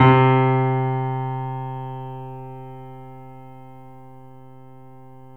C, Piano; InVision Interactive; The Piano

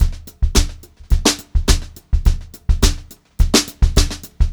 HOTROD BEAT, Brushed Drum Loops

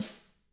DW HIT, Drums; DW KIT1

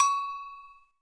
agogo; Greytsounds; Scott Peers Sound Engineering

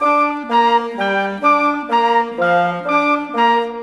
Winds, Orchestral, Rock Pop

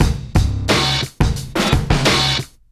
CB LOOP; Zero-G; Chemical Beats

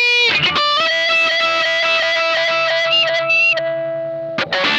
OVER WAH, Funk Guitar; 90 s Sample CDs; Zero G

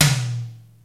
rim tom, Roland JD-990

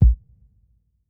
Kit, breakcore Drum Hits

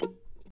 NOISE, guitar

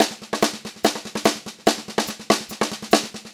144 SPEED, Pop-Rock Drumloops

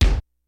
KICKS; Kid Nepro; Elite; KICKS SNARES

